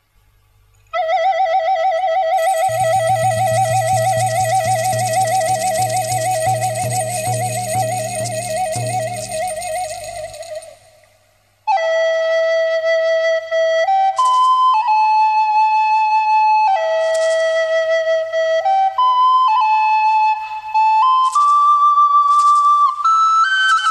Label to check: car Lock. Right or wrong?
Wrong